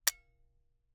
Camera, Aimex SP500, point n shoot, plastic, snapshot; Eiravaein Works; Vaeyan IV